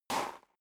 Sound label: step boots gravel light slide short; schritte